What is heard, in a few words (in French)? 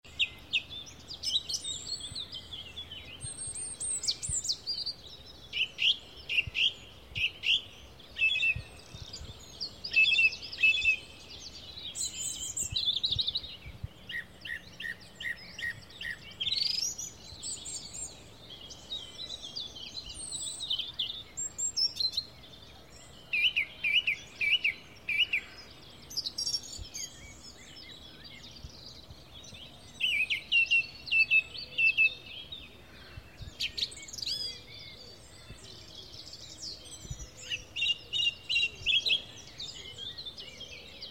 lundi matin 7h30